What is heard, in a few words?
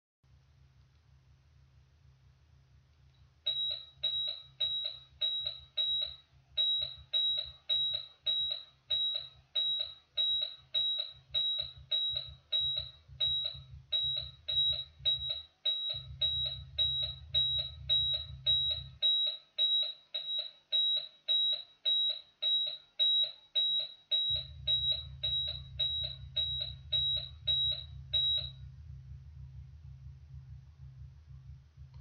radar